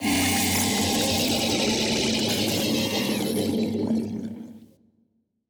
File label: cutholoids death; Stellaris; grand archive; ships